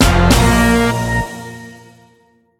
Fasching Fanfare Karnevals Tusch Tataa Short

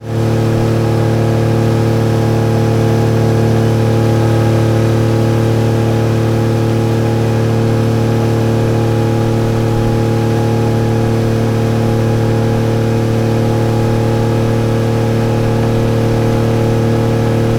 Boot Motorboot Offen Volle Fahrt

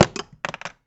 Runterfallen

